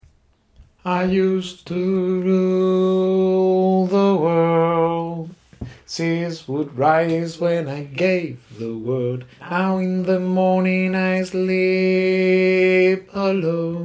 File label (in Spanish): soprano, coro